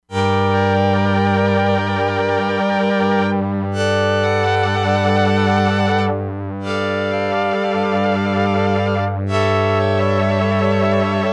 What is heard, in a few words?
SOLO TO ENSEMBLE STRINGS, Crumar Bit 99; Factory Programs